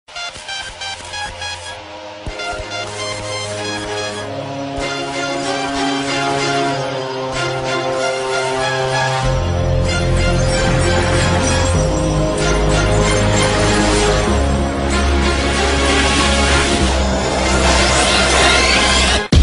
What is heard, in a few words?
Let Beat Begin